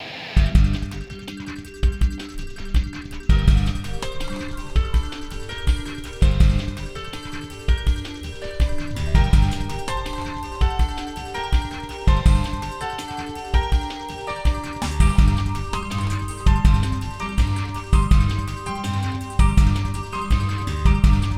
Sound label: mood matrix (allegro)